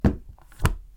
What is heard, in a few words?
putting a book